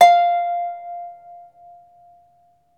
CELTIC HA, Celtic Harp

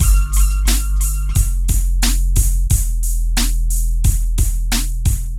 PHATT BEA

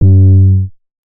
Dusty 808 F, hip-hop drum kit

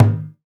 African Perc

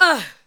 ADLIBS 90; Ueberschall; Jam Box

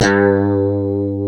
G 2, Guitars; Spectrasonics; Hans Zimmer Guitars VOL-1; 90's Sample CDs; COMP POP+VIB

